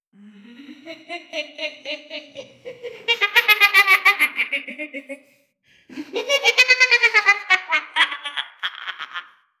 creepy doll laughi; chucky; laughing

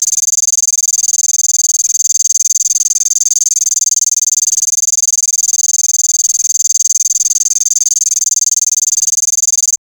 text revealing sound effe

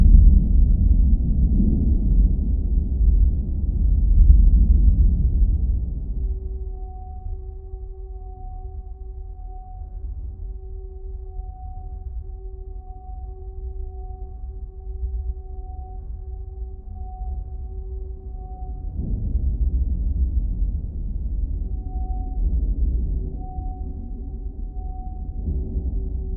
heavy thunder overcast

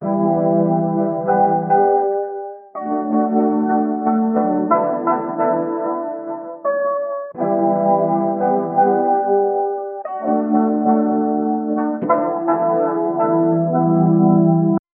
e minor chords loop

